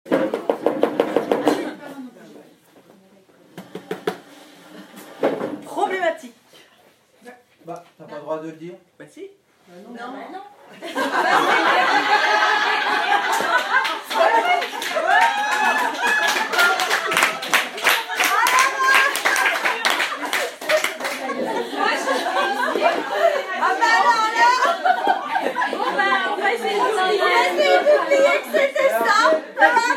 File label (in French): Fou rire